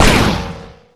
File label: Gun Sniper Rifle Shot; SFX Kit; Weapons